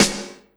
SD, Drum & Bass, 150 BPM

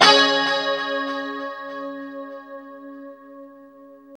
2CHD C#5, Guitars; Spectrasonics; Hans Zimmer Guitars VOL-1; JC-SHEEN

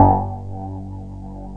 BASS C3, Korg Poly-6

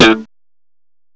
BASS SLAP; M8; CMI Factory

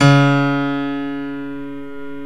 PIA SYN; Best Service Gigapack 1

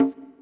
Conga, Yamaha PTX8

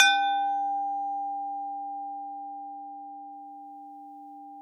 TUB D 4, tubular bell; SoundBytes - Early Patches

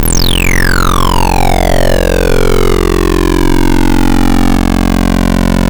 Apple II FX Laser Brakes

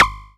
percussion; FutureRetro FR777

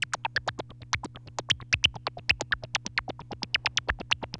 TEK NOISE, SYNTH SEQ

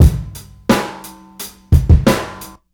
R B Groove 88bpm, Hip-Hop Street Beats; Sonic Foundry; Hip-Hop Street Beats Construction Kit; Street Beats by Poogie Bell

